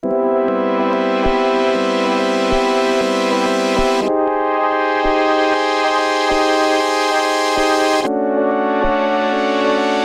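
MOD Devices MOD DUO X J6 Shiro Phantom Zone CV Chorus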